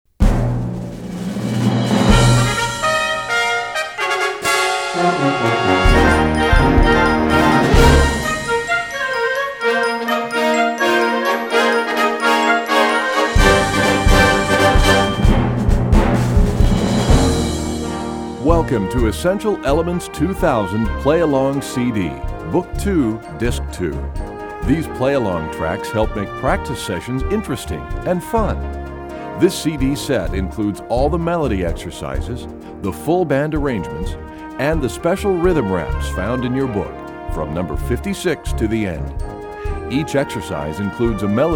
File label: Warm Up Chorale, Percussion; EE2000 Book2